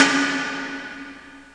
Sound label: can, drums